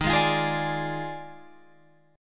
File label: D sharp Major 7th Suspended 2nd Suspended 4th, Guitar, Open F; D#M7sus2sus4; strummed